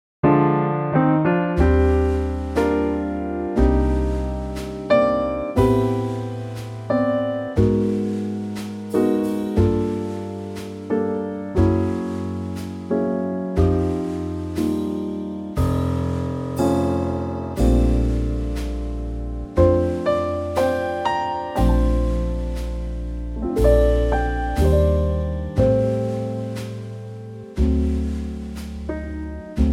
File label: Bb trio